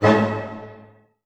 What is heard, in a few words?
D# MAJ, Orchestra, ORCH HITS; Sound On Sound; Peter Siedleczek's Orchestra